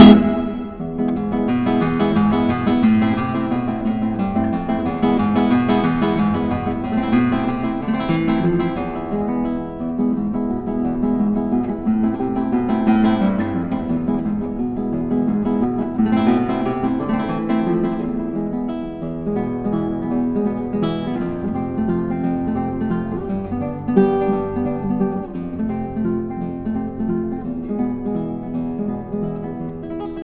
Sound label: flamenco sample